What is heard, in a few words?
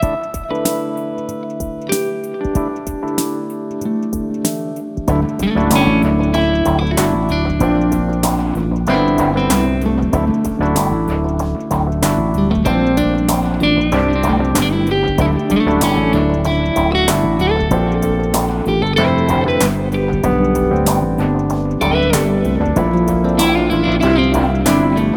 Matinal, alarms